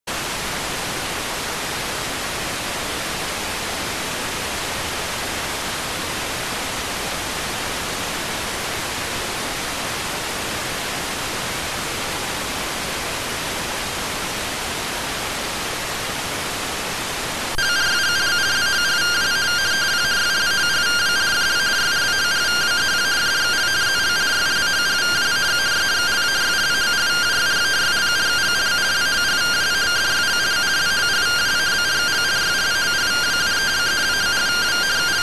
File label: rtty rx